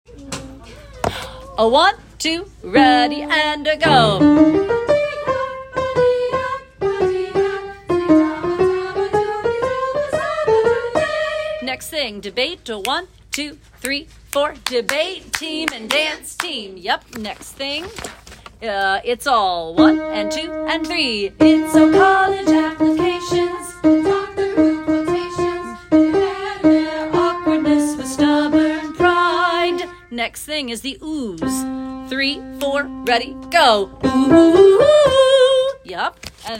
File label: Soprano, vocal tracks; Mean Girls